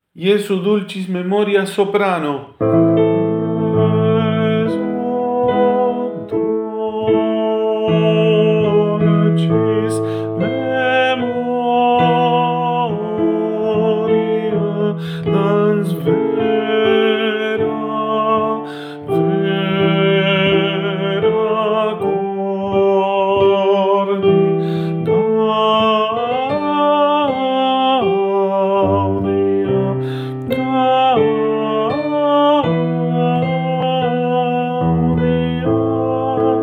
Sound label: SOPRANO; VICTORIA; Jesu dulcis in memoria